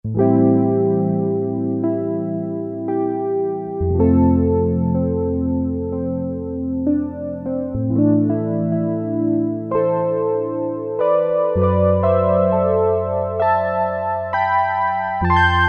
Mellow Piano, Roland Juno-60; Factory presets

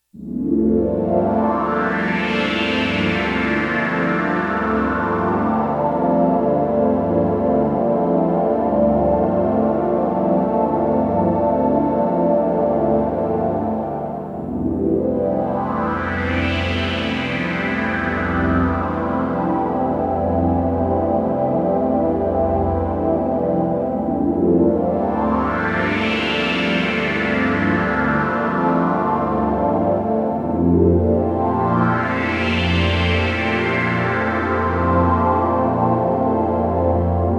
Roland JX-1, Factory presets; Additional factory patches

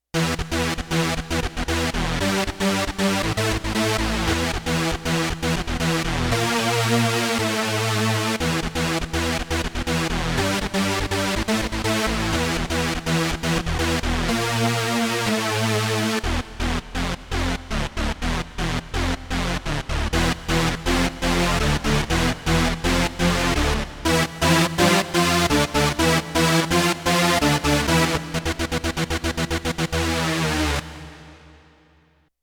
Hard Whoover; Roland VC-1

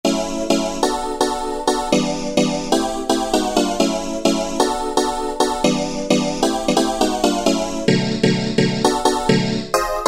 Ravin' Chords, Roland SR-JV80-06 Dance

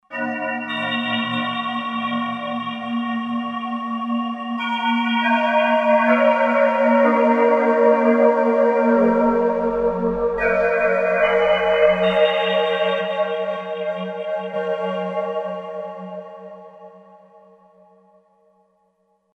Hollow

